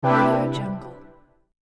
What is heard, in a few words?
dark chill ambient video game confirmation menu sound